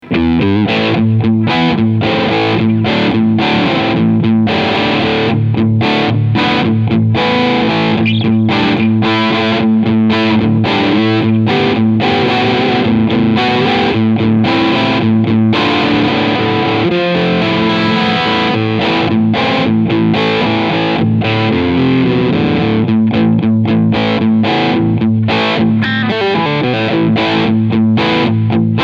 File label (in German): Crunch Steg Mitte